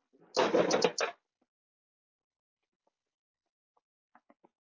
Kick

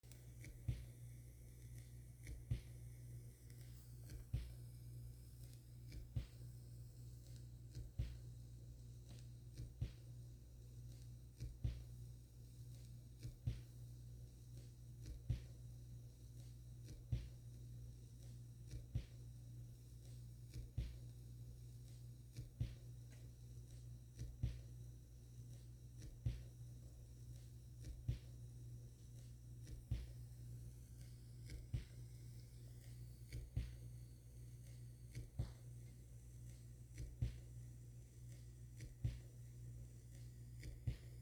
Record spinning

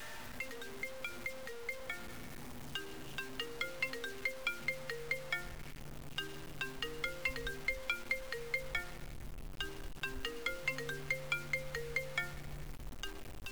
Cell Phone

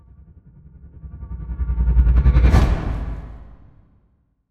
sub whoosh transition

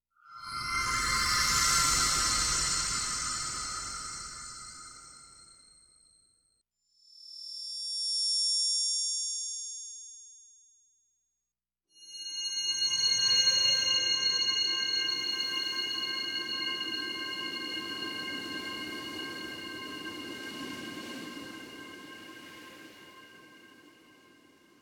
Sun appears sfx

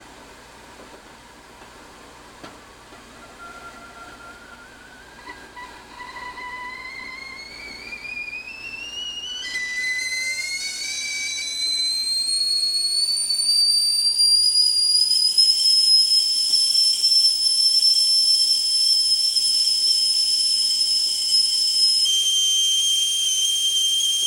kettle

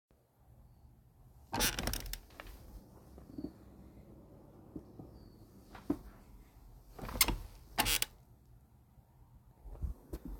Sitting & standing up from chair

